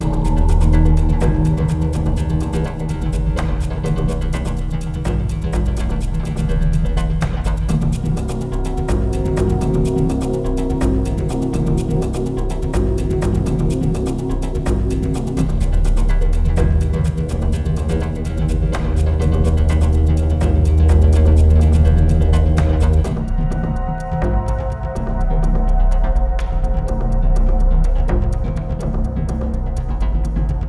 Rhythm loop; Ace Lightning; Windows

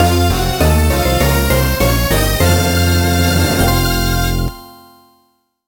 Item Fanfare; Shantae Risky's Revenge

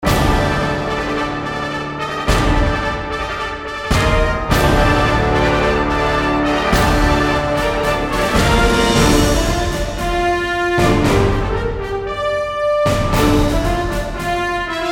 Menu; Unreal Tournament

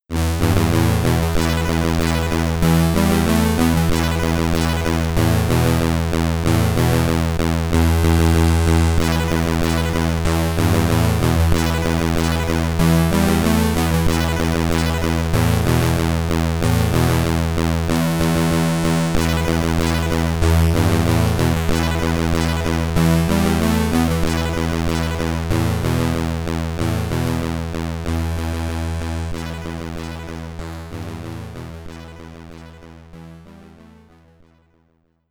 SID Stereo; Kendo Warrior; Commodore 64

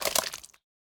Squished Drips; Boomerang Fu

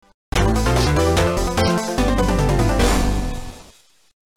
Mini Game Won; Barnyard; Game Boy Advance; GBA

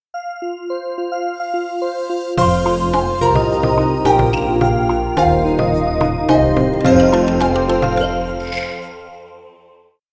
Win Jingle; koi solitaire; windows

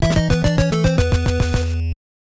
Player Miss; Castlevania III: Dracula's Curse; NES

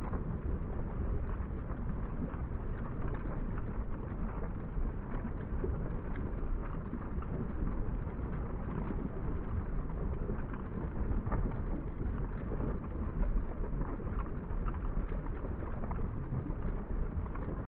Lava Idle

